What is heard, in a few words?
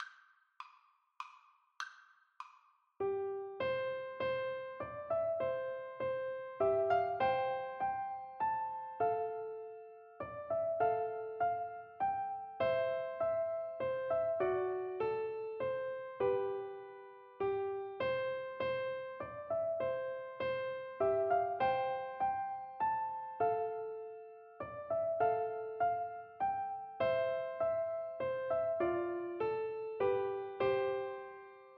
Piano Duet